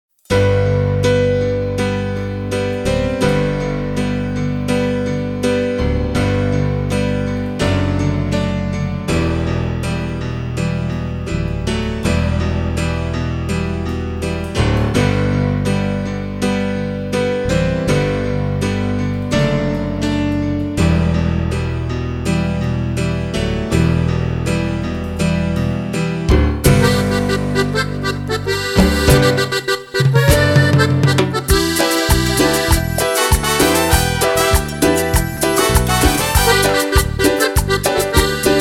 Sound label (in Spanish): Cumbia